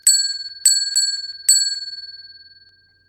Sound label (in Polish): dzwonek